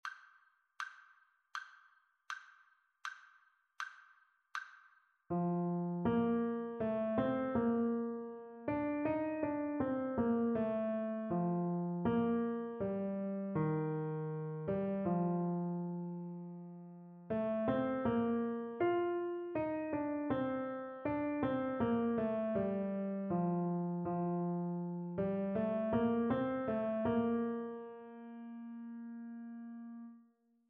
partially completed melodic dictation; ear training

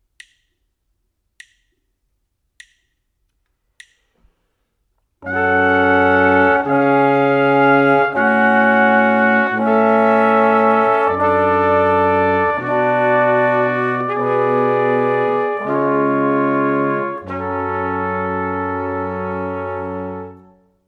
harmonic dictation; ear training

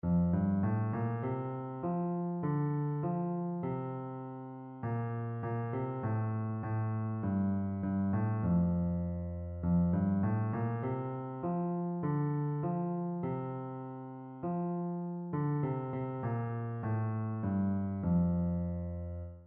mode identification

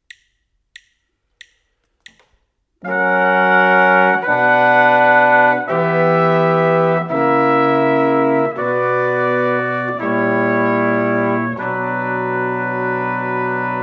harmonic error detection

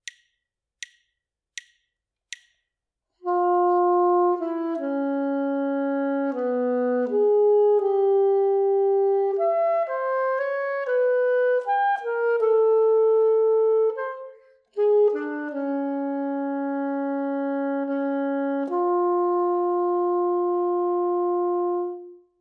melodic dictation; ear training

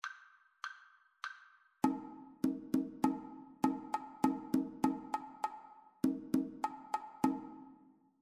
two part rhythmic dictation

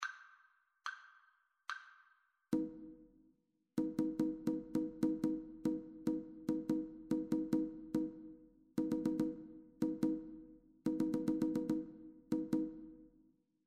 rhythmic dictation

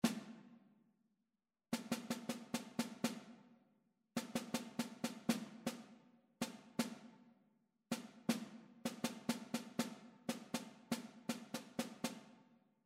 rhythmic error detection

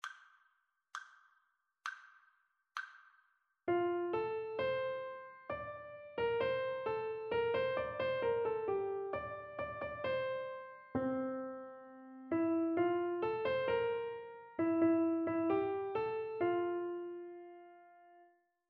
rhythmic dictation

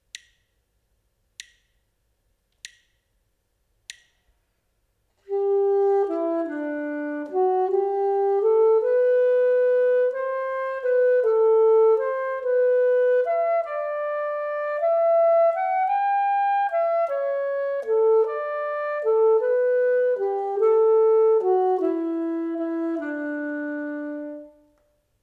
partially completed melodic dictation

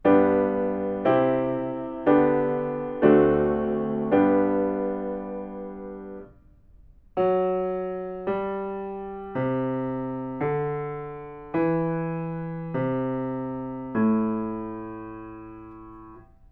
melodic fragments

